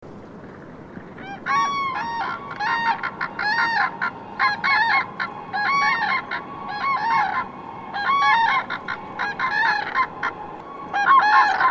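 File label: common crane voice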